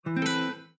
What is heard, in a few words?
Heritage Battery Critical; Windows 7